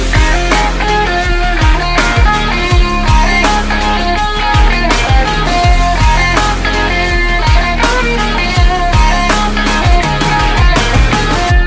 Guitar

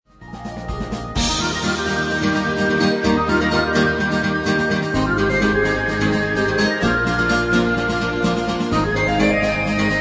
sacred flute

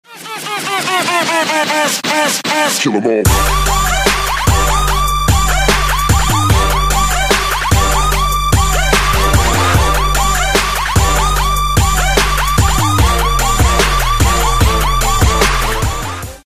TRAP

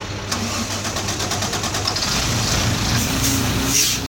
Bus Start

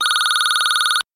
Siemens Ton 2

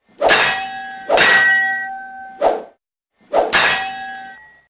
clashing of swords

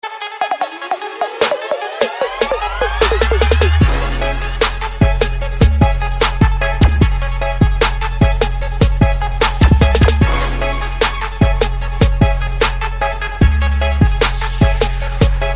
probass beat; hip hop

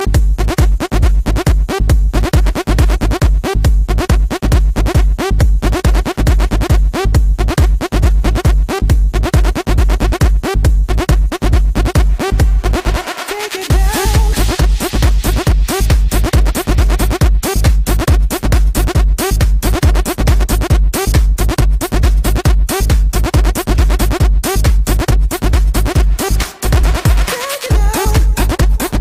electro, ringtones, electronic

